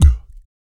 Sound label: BASS BOMB; Blues Soul; Spectrasonics Vocal Planet